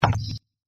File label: Xlop